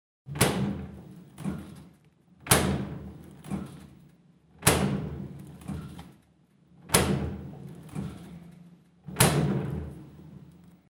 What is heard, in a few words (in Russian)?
dverca holodilnika